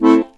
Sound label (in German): HOHNER, AKKORDEON